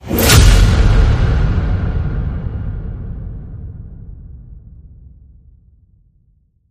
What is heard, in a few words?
Big Hit